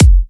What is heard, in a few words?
kick; iceshrimp; syuilo